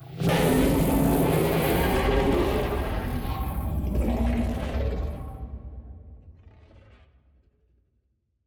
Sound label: mutated void worms; Stellaris; grand archive